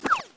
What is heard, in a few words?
rollover book small; activity center; backyard; preschool time